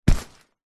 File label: luggage fabric make up bag grab pick up